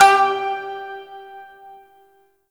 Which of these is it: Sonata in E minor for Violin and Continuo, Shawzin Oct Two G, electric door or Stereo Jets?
Shawzin Oct Two G